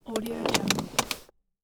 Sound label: squeaky car seat